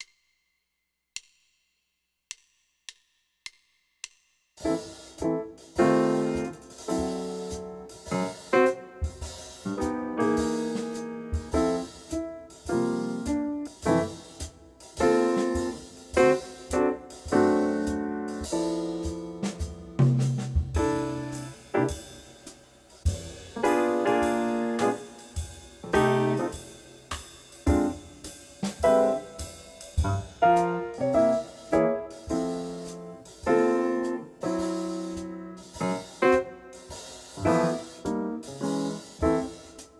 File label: med swing 104 bass play along, jazz